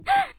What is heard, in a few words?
jump; Nejlepší České Hry